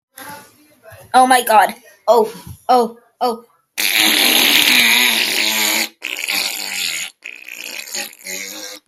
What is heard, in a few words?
fart explosion